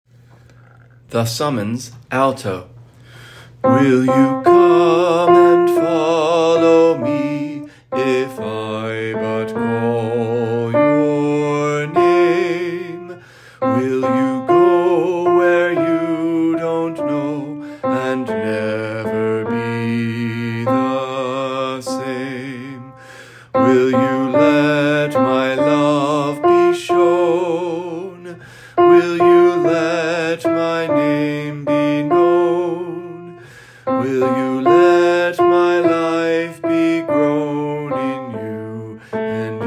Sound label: Alto; St. Anthony of Padua - Choir Sunday